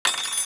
metal spoon, clattering

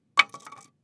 drop back, small styrofoam long thin plank

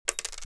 dropping metal pin on wood

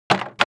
drop rand shuffled, large rubber flat squaremat

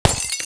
shattering glass vase

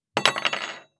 drop, small metal long thin spoon

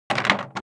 drop rand shuffled, large rubber flat squaremat

